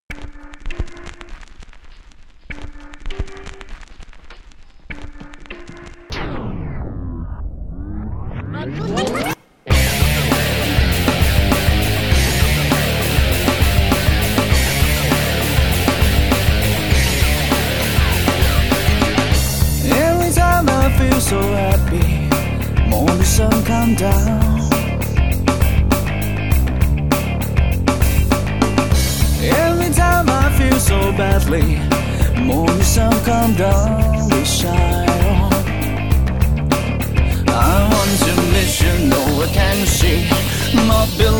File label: rock; songwriting